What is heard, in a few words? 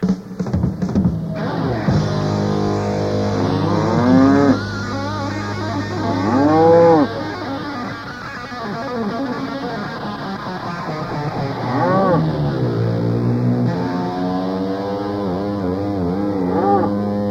field recordings eruption; freerange